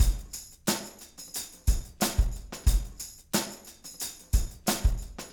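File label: beat tam hh, Room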